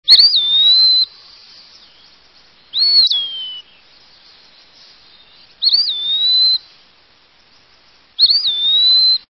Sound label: Eastern Wood Pewee, songs